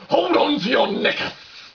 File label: pain; svencoop; cracklife; controller